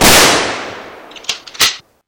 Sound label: gunshot